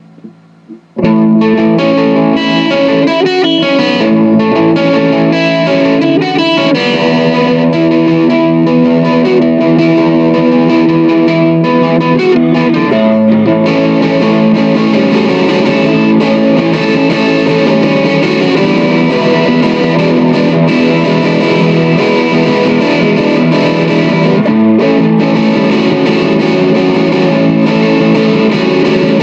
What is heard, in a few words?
two seat tug plane